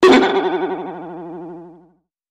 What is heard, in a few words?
Boing Box Vibrato